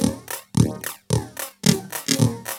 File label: LOOP, TECHNO; TECHNO-BOX; ELEC-LOOPS